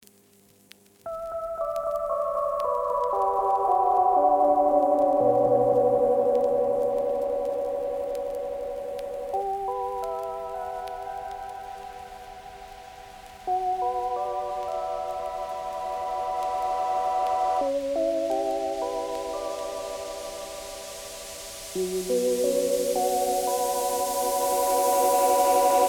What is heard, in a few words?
Interlude